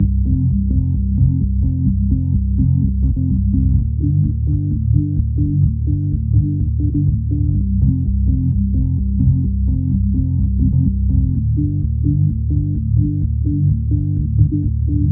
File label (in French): basse